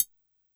Triangle Mute, Yamaha PSR-E323 Standard Kit

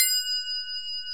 Crotales; Orchestral Perc; Roland LCDP03 Orchestral Perc

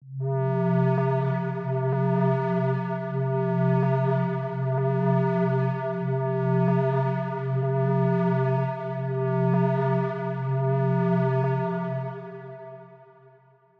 reason syn; neuro; Sinistarr

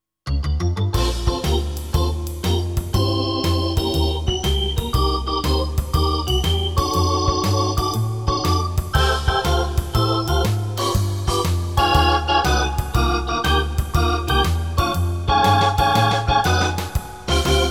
B3 Organ; Masterbits CLIMAX 8

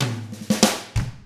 95 FUNK INT L, drums; ILIO; Double Platinum Drums 1

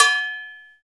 CowBells

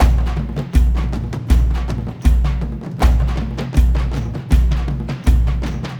AF TRANCE; Spectrasonics; Heart of Africa 2

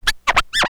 TURNTABLES, Hip Hop; InVision Interactive; 40 Oz Phat Slammin

